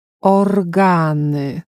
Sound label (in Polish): organy